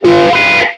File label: window attention; jam; stereo